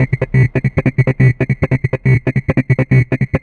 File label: Trance Bassloop 140bpm, Bass Arps